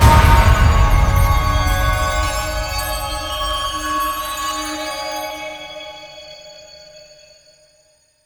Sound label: Chimes; Ballas; Warframe; The New War; Throne Room